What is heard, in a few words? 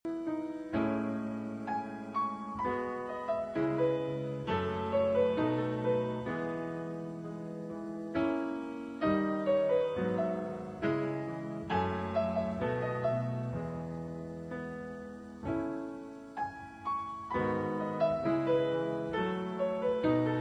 Serenade Piano; Fruits Basket